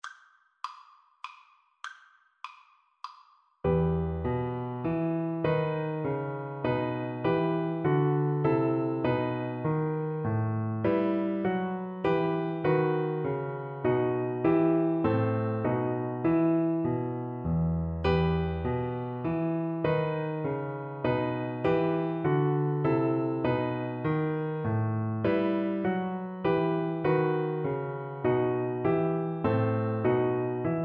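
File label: viola karaoke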